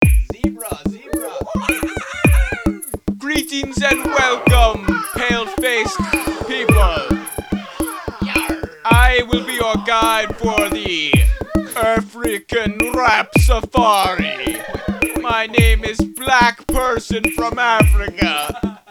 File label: rap